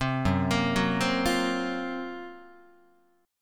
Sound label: F sharp Major 7th Flat 5th, down, Guitar; F#M7b5 Chord; arpeggiated; 3,2,1,4,4,4